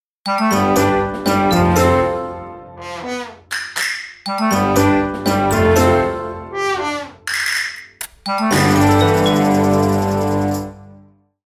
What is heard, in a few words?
cute jingle